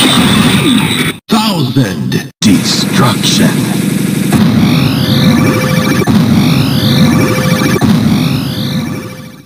rage rocket; freak fortress 2

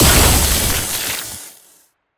gh fire; gasharpoon

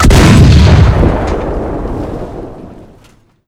large sniper shoot; freak fortress 2; sniper ops; nighteye

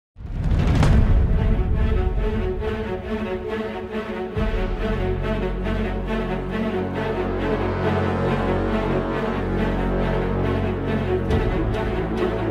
intromusic; angriff offizier

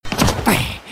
shin jump; ff2; lof shin

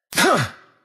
superjump; florentino

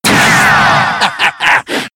teleport; freak fortress 2

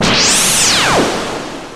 super jump; freak fortress 2

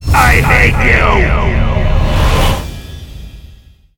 backstab; ff2; demonic wizard; liafail

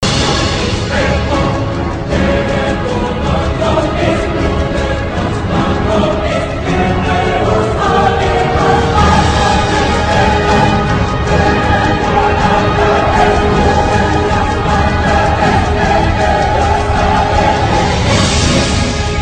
intro music solo; true admin

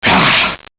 stunned; xinalized

